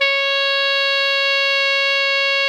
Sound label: ALTO MF C#4, Saxophones; Greytsounds; Philip Wolfe David Crozier's Saxophones